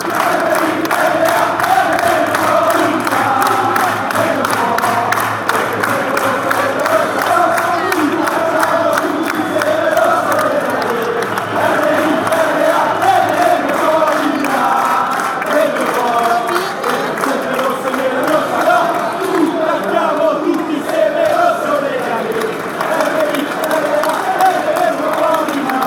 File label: ultra fanchants